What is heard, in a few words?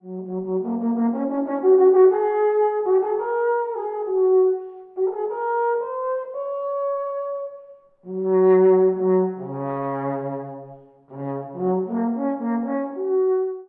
Alphorn